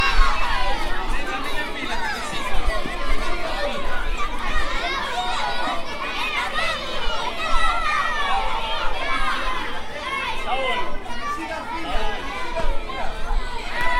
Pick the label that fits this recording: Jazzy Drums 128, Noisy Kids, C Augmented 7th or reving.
Noisy Kids